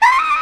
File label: SAX SQUEEL; E-MU Formula 4000 Series Vol. 5; Protozoa; Phatt Presets